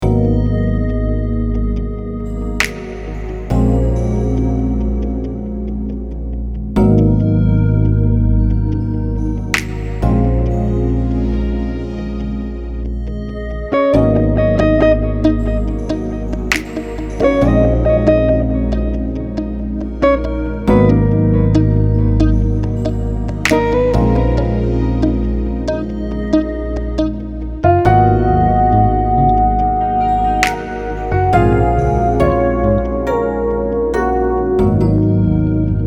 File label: rhodes